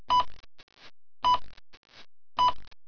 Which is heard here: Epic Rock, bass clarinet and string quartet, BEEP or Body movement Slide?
BEEP